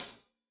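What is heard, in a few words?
hat closed, hi-hat cymbals stereo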